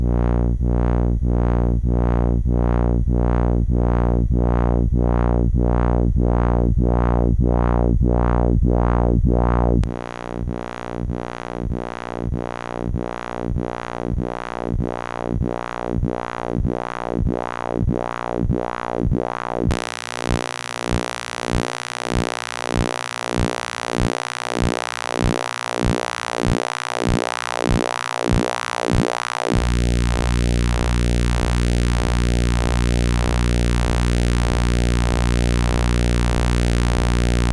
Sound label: SID filter